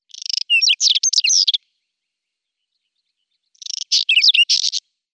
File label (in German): Braunkehlchen Saxicola rubetra; Vogelstimmen